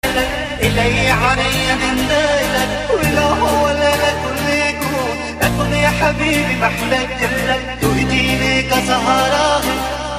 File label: arabic ringtone